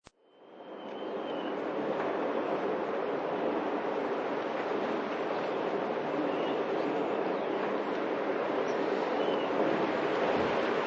Mount Ruapehu Windy, Wind whipping around the ski lodges and whistling thro; Mount Ruhapehu windy: Wind whipping around the ski lodges and whistling through guywires on ski lift supports.